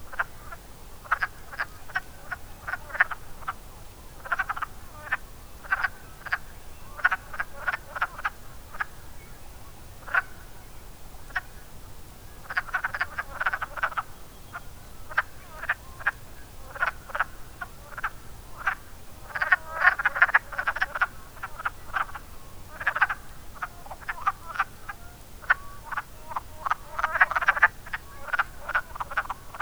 wood frog; Wood Frogs 2009, version 2

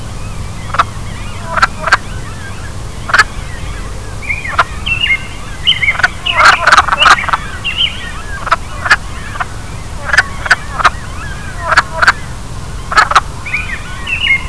wood frog; Wood Frogs 2008, version 2

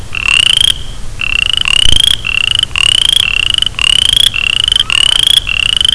boreal chorus frog; Boreal Chorus frog 2008; zoology

